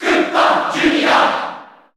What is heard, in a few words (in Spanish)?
Grito del público (Japones); Bowser Jr.; SSBU